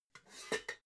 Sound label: pots clank quiet